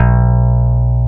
bass E3; East West - Global Sounds

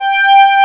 ST SA, SYNTHESIZER ANA STRINGS; AKAI S6000 CD-ROM - Volume 1

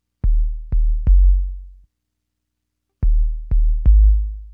BAS, Hip Hop and R&B Drumloops; Best Service ProSamples vol.01; AKAI

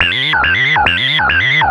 Analoop, Industrial

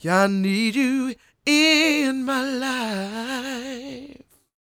croon, Vocal Planet Blues Soul; Spectrasonics

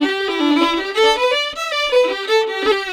160 G violin, Celtic Flavours; USB Soundscan vol.42; AKAI

